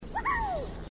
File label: happy grunt